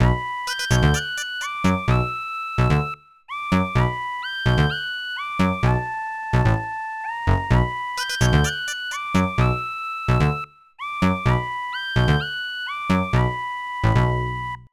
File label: Hiphop; Dream Dancer; NDS